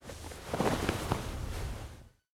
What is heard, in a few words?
Mannequin Towel